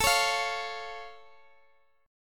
A Augmented A

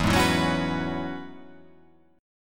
E 6th Add 9th E down

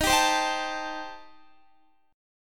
D sharp 6th Flat 5th, D sharp, down, Guitar; D#6b5 strummed

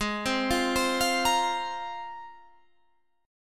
Ab 6th Add 9th Ab, Guitar, Half Step Down; arpeggiated

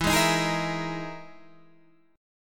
E-Major Sharp 11th E, Guitar DADGAD

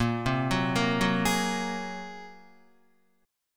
Bb 7th Suspended 2nd Sharp 5th Bb, Guitar; Bb7sus2#5; arpeggiated; 6,3,4,3,6,3; JGuitar

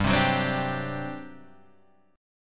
G Major 7th Suspended 4th, G

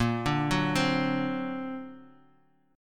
D Augmented 7th A sharp, guitar

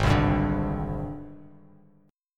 Ab Major Sharp 11th Ab down Bass Standard; AbM#11 strummed; JGuitar

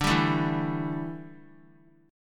D Suspended 2nd Suspended 4th D down; strummed

